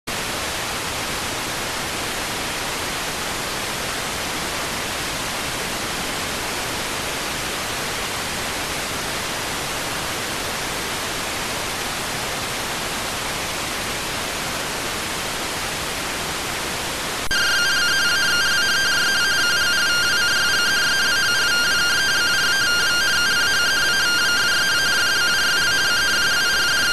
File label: rtty rx